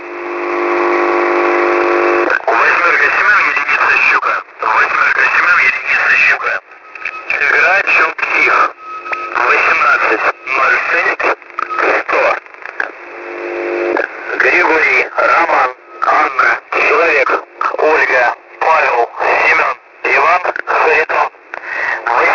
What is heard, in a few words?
usb msg new format